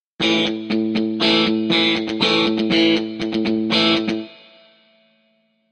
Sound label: guitar pattern